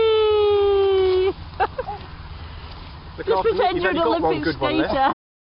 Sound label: swing, picnic